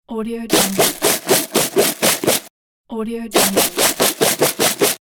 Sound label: saw pack loop